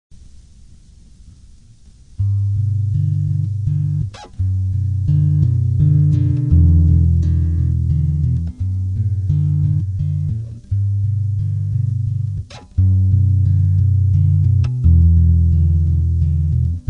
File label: guitar